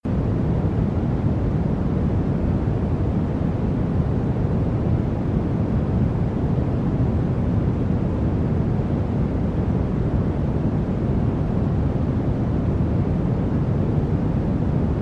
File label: dark brown noise